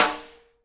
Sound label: snare RIM RING, Live Snares